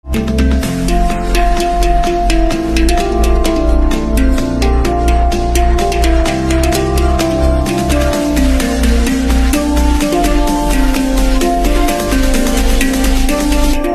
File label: handpan ringtone